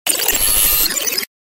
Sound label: Galery Noise; Sound Ideas - Galery Noise 002